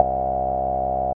filtery synth bass

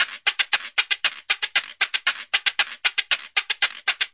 116 wood fish, drum loops and breaks

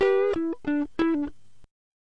funky, guitars; MELODIC SAMPLES

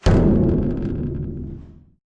big cans, Big Rattle Cans

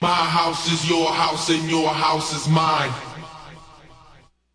my house is your house, MALE SINGING

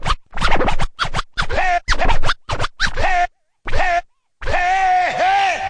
turntable hey hey, Scratching and Turntablism; DRUM LOOPS and BREAKS

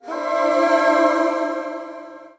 Layered Vox, Female Layered Synth Vox

